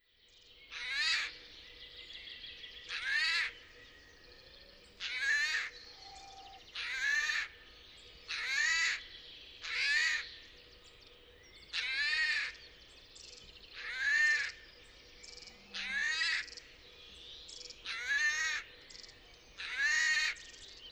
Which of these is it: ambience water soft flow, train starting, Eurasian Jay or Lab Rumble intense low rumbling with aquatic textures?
Eurasian Jay